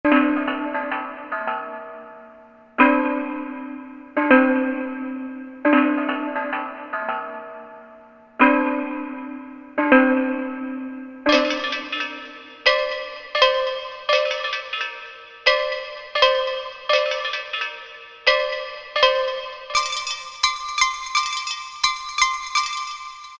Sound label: Rhythm, Korg M1; Factory preset examples